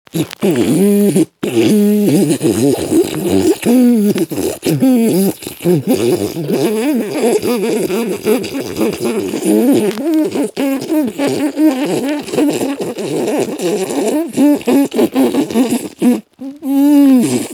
битбокс на вдохе

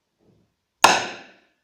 Удар барабанной палочкой

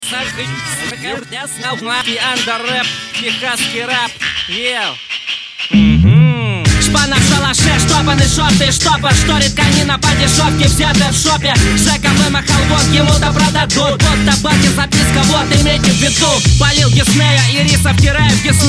Техасский реп

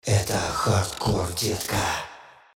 Джингл

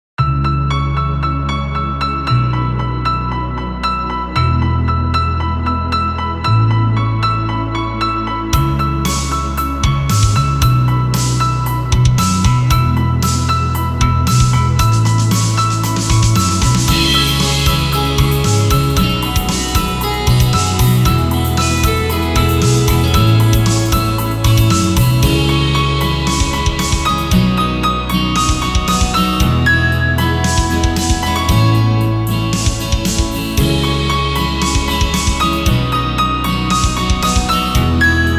Summer Rain, Инструментальная Музыка

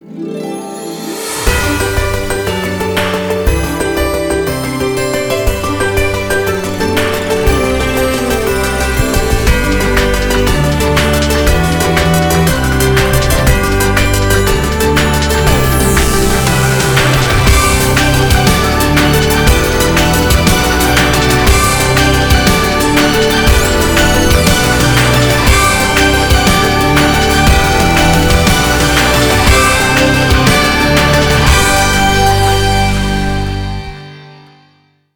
Музыка для Рекламы, TVC Music; Alyans Bank

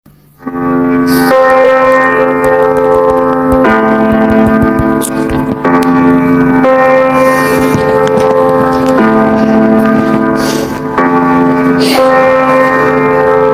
ЖИВОЙ ЗВУК

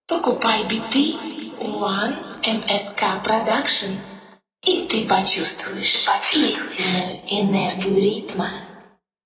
Джингл

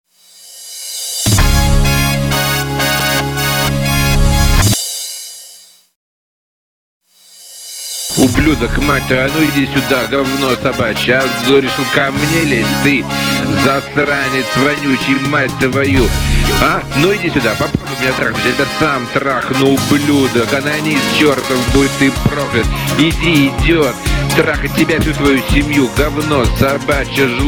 Торжественные фанфары; Выход ведущих

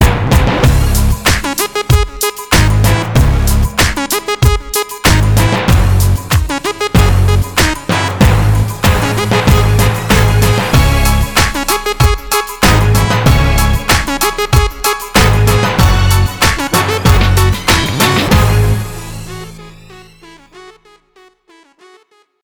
Музыка для Рекламы, TVC Music

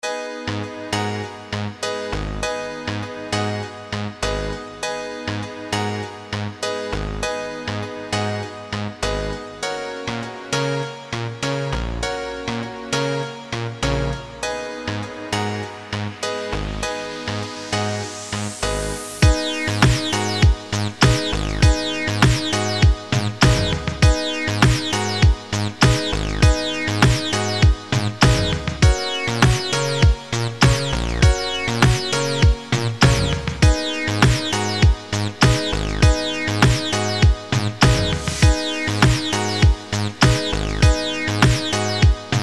Подложка для видео

